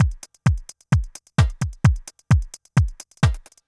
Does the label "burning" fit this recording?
No